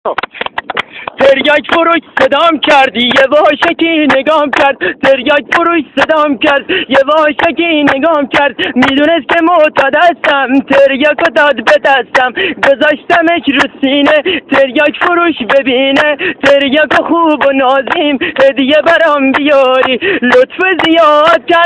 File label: new music tanze khandedar